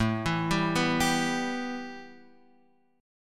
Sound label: A 7th Suspended 2nd Guitar Drop D; x,0,2,0,0,3